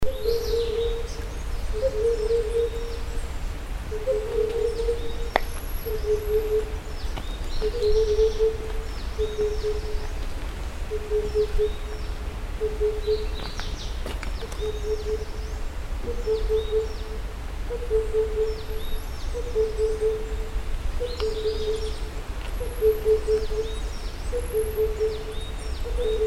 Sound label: cuckoo himalayan Cuculus saturatus